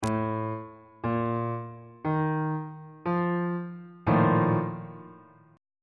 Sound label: a sharp major 7th suspended 4th 3rd inversion on bass clef